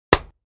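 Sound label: 2x4 Smashed Sledge Hammer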